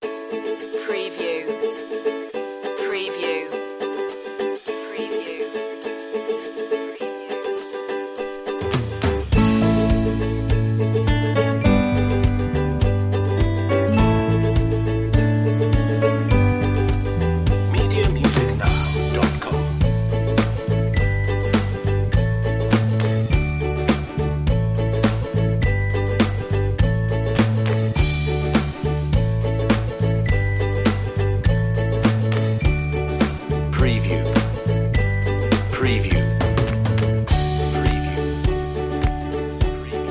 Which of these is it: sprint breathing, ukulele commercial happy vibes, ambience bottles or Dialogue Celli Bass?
ukulele commercial happy vibes